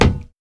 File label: Step Metal Pipe